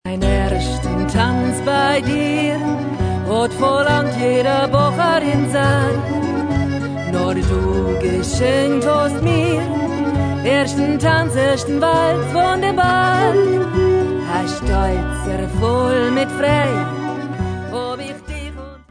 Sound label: Waltz